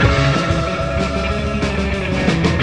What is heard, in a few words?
Fast Powerup; SpongeBob SquarePants Saves the Krusty Krab